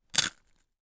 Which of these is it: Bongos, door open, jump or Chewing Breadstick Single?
Chewing Breadstick Single